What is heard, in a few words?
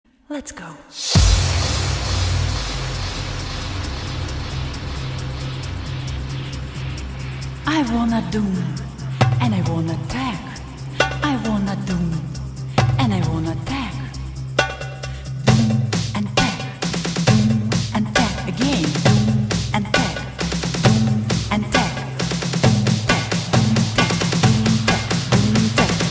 Orient Pop